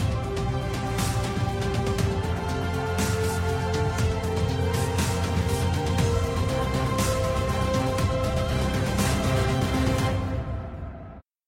Outro; Voltron LD

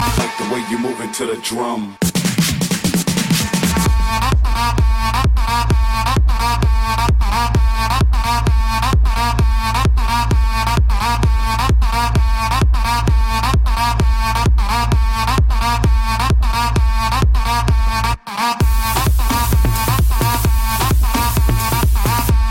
Techno

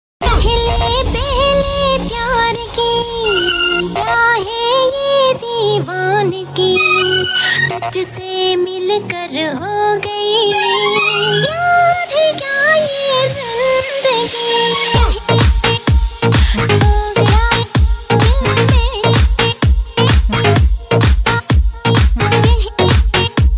Indian Dream Remix

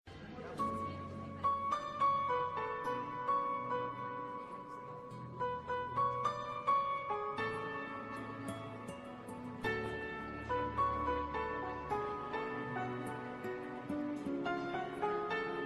Piano

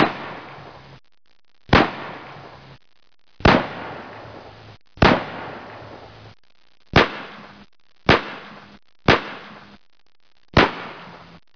Gun Shots

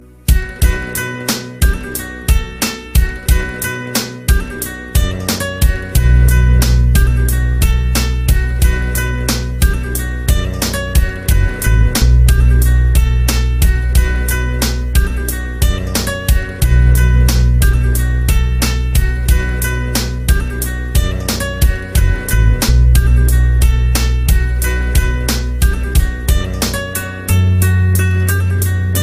Spanish guitar

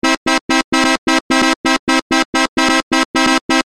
Message Tune

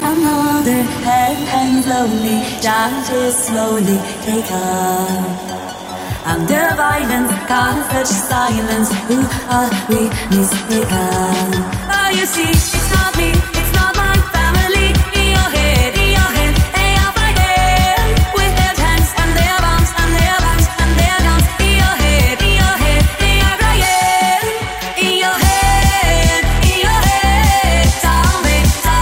Zombie

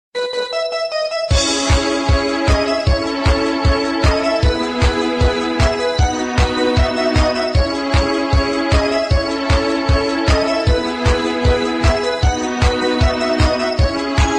Special Tone

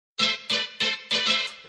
Intro Sound

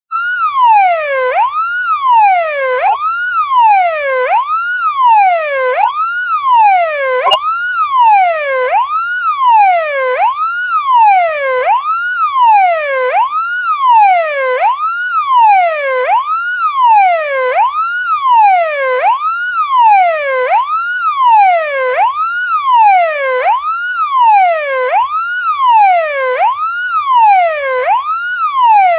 Old Police Siren

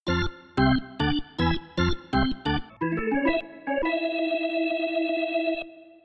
Ice Hockey Organ

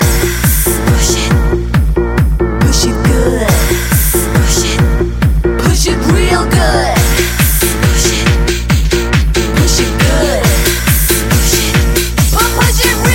Techno Mix

